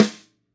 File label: Yamaha Snare